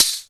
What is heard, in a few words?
DM5 Perc, Alesis DM5 drum machine